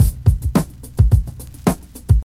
72 bpm fresh drum beat A key, kick tuned to the A note; drum loop